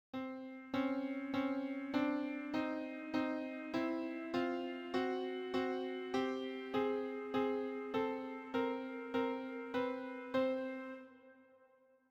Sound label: chrom intervals